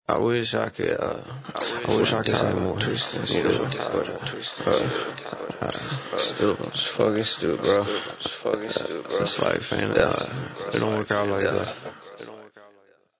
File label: Vocals Full Acapella